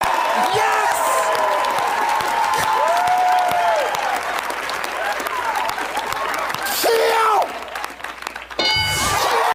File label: yes kill correct buzzer